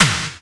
snare drum; Crypton - DrumBase5000; 52 SNARES

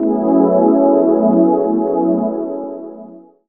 DR. LOOP; X-Static Goldmine 3

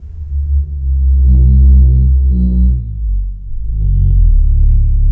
BASS R, Drum & Bass 175 BPM; Ueberschall; Drum & Bass Flash Trackz

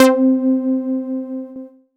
C3, Synth Line; 90 s Sample CDs; NiceBeats; SynthLine VOL-14

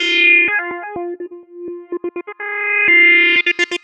Rambling Seqs, Synths; Sonic Foundry (Sony Creative Software); Underground UK House & Electro

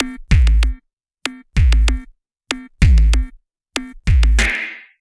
Structured Rhythm, IDM Rhythms

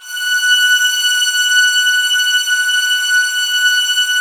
F#6, soft violins; Q Up Arts; Denny Jaeger Master Violin Library

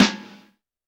Snare, Roland TD-20

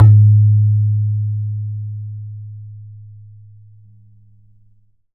BAYAN, Drums Percussion

